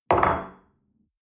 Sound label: espresso place on table medium